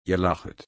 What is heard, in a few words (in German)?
lachen